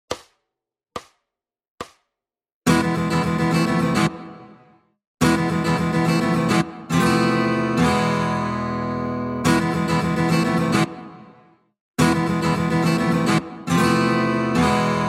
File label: Fingerstyle Spanish Style Slow 75bpm